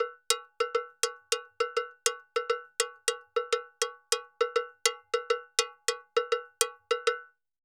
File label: Campana 136; Best Service; Festa Latina VOL-1; Drums & Percussion